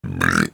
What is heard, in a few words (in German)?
blubber ruelps